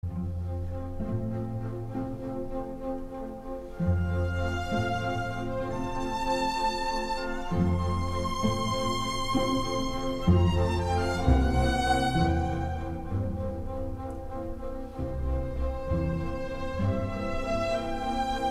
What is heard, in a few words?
Piano Concerto in C major; Mozart, Wolfgang Amadeus; No. 21; K 467- Mvmt 2 Andante